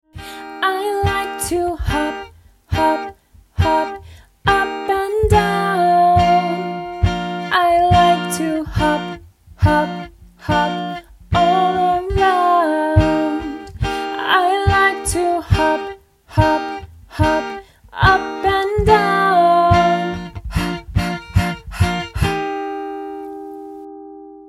H song

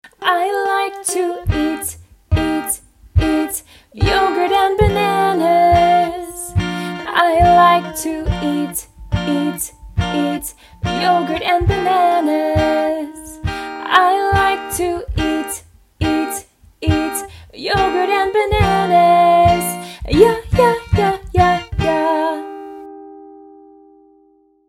Y song

